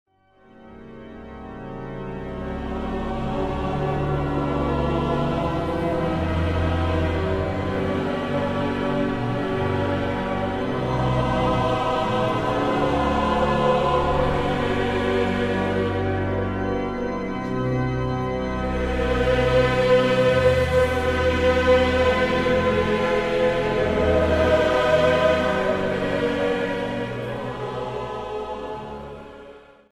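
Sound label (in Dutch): Psalm, orgel; Nijmegen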